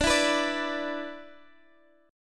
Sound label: B-Minor Double Flat 5th